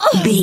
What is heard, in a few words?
voice female hurt pain mild